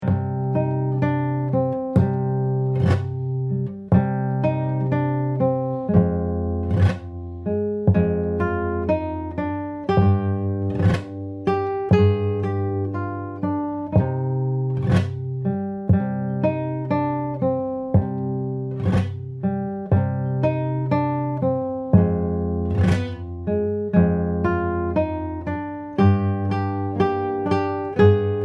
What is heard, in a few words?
Jingle Bells